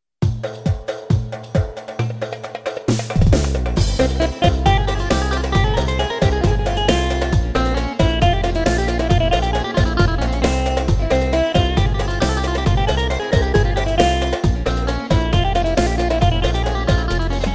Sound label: psr a1000 tr